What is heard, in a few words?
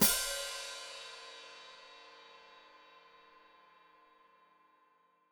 Hat Closed Open, Hi-Hat